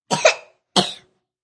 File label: Child Cough, A child coughs; Sick (Sound Effects:Human)